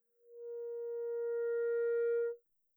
sneakin notongue barrel, clarinet articulation

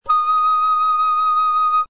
D sharp 6 alt, flute; modern

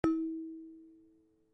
bell plate node second mode; bellplates

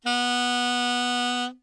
C sharp 5, saxophone, tenor

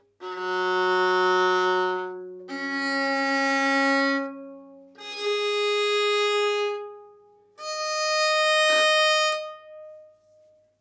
Open Strings

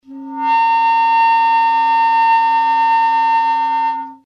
D sharp 4 D sharp 5 B 5, clarinet

